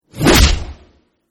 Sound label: round score damage crash